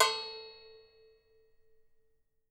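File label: deep cow, right, Percussion; Miroslav Vitous - Percussion & Harp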